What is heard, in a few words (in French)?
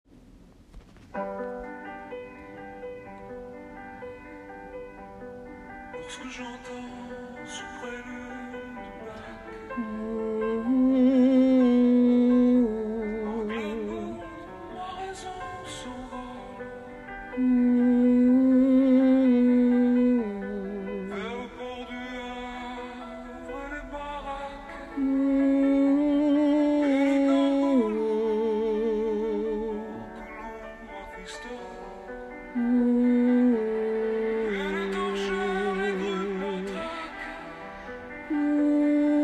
prelude vocalises couplet; Prélude vocalises couplets